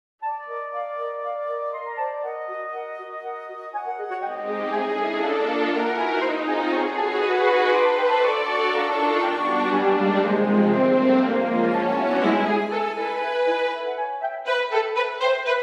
atmospheres

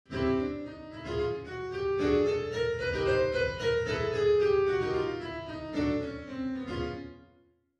chromatic 64 bit

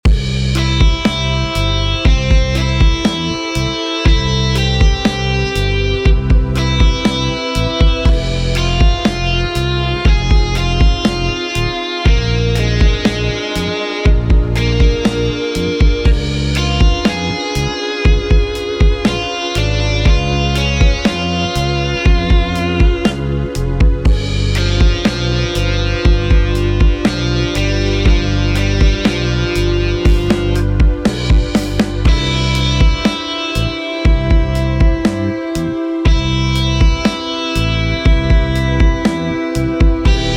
voice guitar; factory

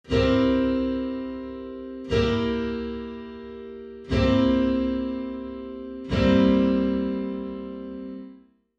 testing chords

